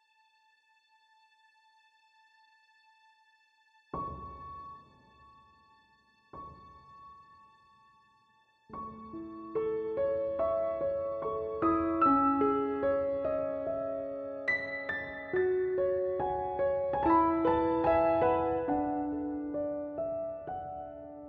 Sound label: emotional piano